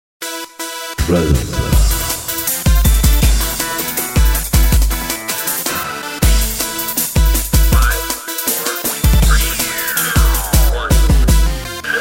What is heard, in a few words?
Trip Machine